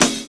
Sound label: analog fi; Univers Sons - Kitch 70s